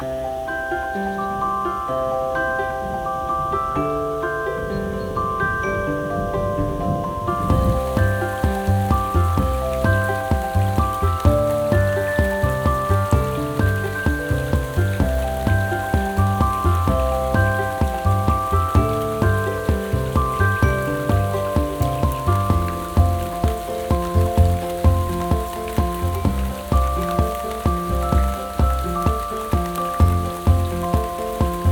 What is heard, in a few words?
dusk rain; dieselfarm